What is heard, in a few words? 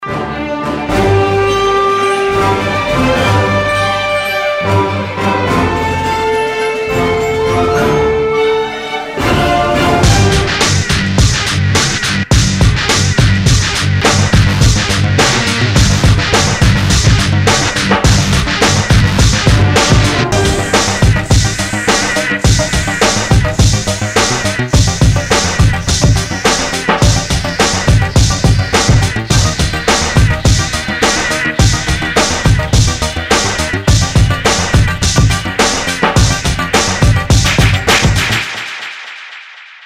Western dreams electro industrial